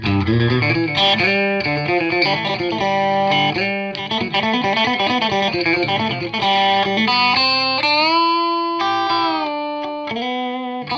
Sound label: pickin